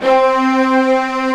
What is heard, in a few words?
STR SEC C3; AKAI S-Series CD-ROM Sound Library VOL-1